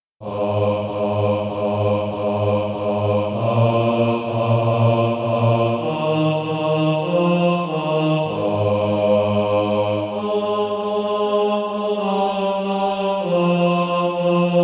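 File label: bass; Eternal God Whose Power Upholds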